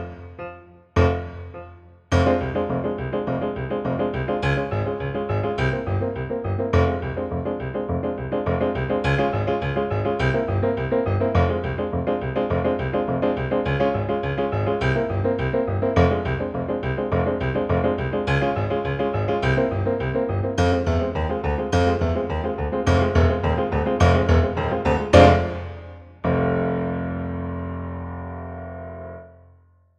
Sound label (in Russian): Горский СКА